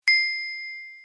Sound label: HTC RING BELL